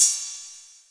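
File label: Roland CR-8000 cymbal; DRUM MACHINES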